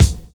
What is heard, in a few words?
VINYL BD, Drums Dance & Groove; USB Soundscan vol.11; AKAI; VINYLKIT